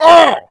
Master Damage

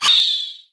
Vampire Bat Dmg

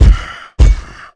Guardian Walk

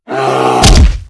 Ice Bear Action

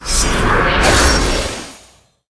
Power Drain

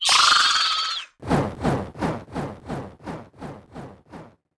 Vampire Bat Idle

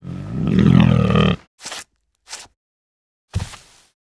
Ice Bear idle; Seer; GamigoNA

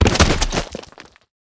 Caiman Giant Die

